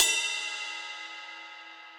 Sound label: Ride Bell Direct Natural; Drums; Drum Hits; Standard Hits; Ableton; Session Drums Studio